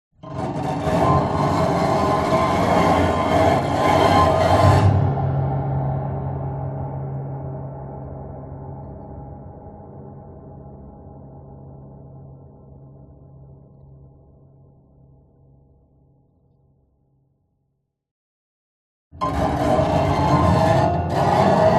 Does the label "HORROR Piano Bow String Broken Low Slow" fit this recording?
Yes